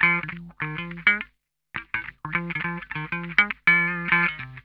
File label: OLD TIMER, Funk Guitar; Zero G - Funk Guitar